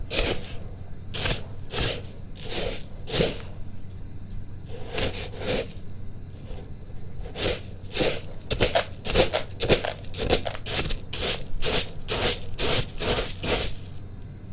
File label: Liter Box Sound